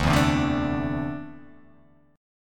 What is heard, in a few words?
D 7th Suspended 2nd down, chord; D7sus2 strummed